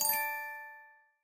count Up End; netent; secretofthestones

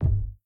reel Stop; netent; longpao

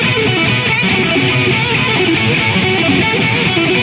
solo multiplier guitar loop; netent

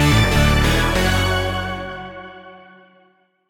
fs small Win; netent; neonstaxx

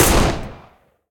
Thug HGun; netent; narcos

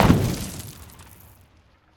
block explode; netent; pyramid

